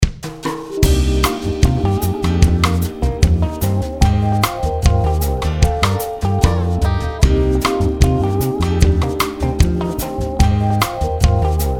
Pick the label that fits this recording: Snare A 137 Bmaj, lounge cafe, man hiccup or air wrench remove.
lounge cafe